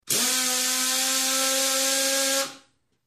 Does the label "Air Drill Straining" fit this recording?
Yes